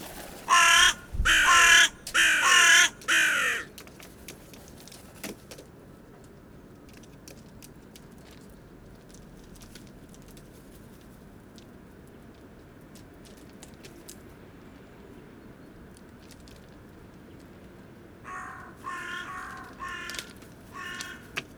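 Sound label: hooded crow corvus cornix cornix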